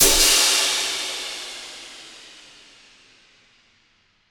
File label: Crash, impact samples